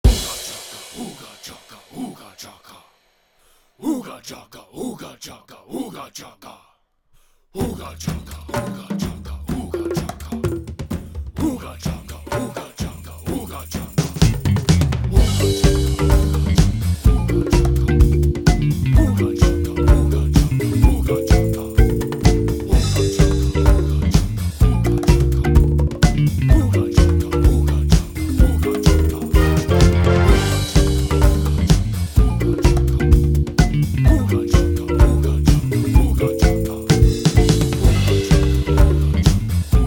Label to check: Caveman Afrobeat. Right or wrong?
Right